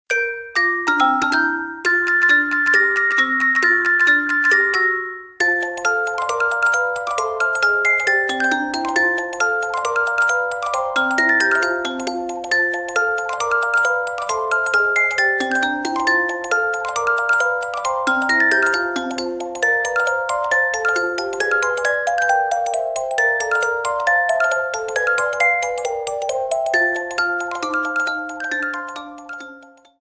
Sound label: Ground Night; SMM2; SMW